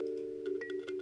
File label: LOOP Piano Pouce Stereo